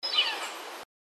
sapsucker yellow bellied; species/birds; wbba

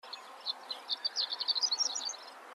LARK HORNED; species/birds; wbba

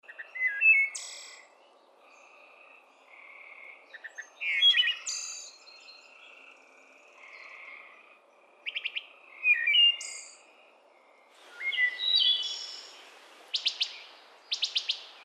thrush wood; species/birds; wbba

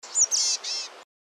CHICKADEE BOREAL; species/birds; wbba

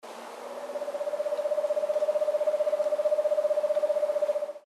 OWL EASTERN SCREECH; species/birds; wbba

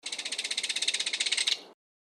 KINGFISHER BELTED; species/birds; wbba

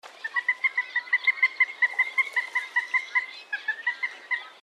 moorhen common; species/birds; wbba

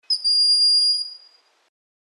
HAWK BROAD WINGED; species/birds; wbba

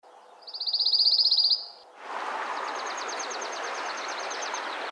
WARBLER PINE, birds; species/birds; wbba

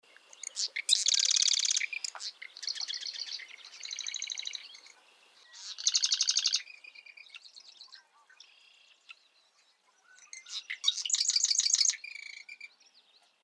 WREN MARSH, birds; species/birds; wbba

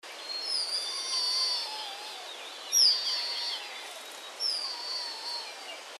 GROUSE RUFFED; species/birds; wbba

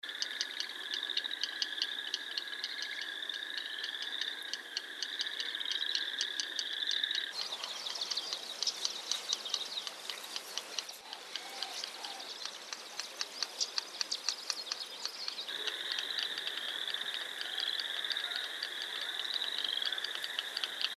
RAIL YELLOW; species/birds; wbba

